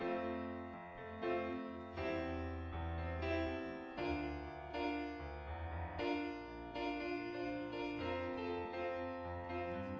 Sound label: nodrums inst sim